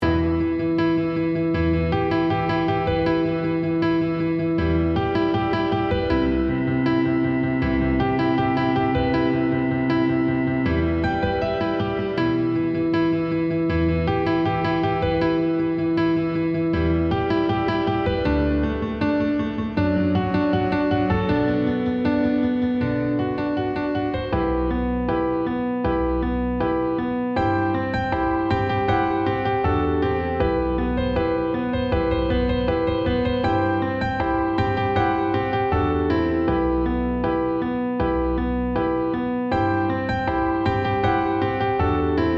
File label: piano loop